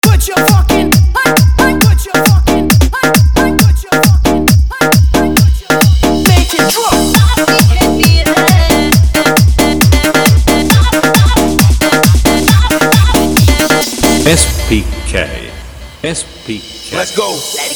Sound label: Bhojpuri Dj Remix Song